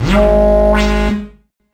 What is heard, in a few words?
scan, Zaps and Beeps